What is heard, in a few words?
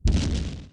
explosion; burutaru; android